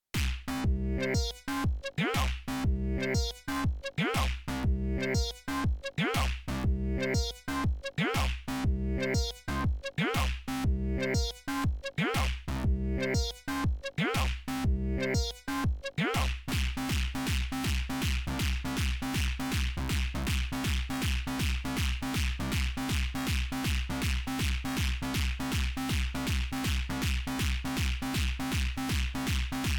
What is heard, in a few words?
TE PO 137 Jam